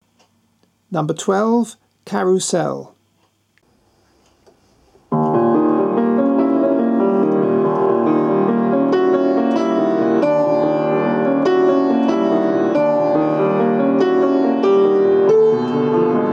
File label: Carousel